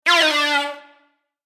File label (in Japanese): グラビティチューブ